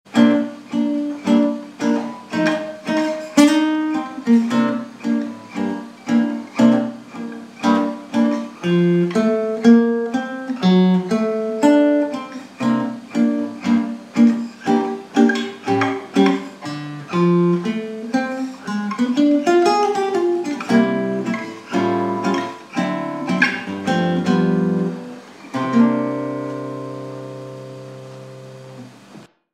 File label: Blues